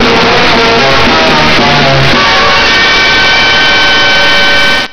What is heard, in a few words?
dramatic cue